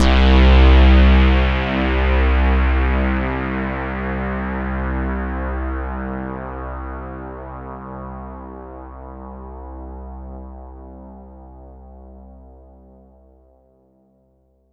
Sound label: pad C2, Oberheim OB-Xa